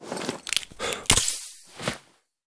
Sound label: stimulator use; STALKER; IWP; item usage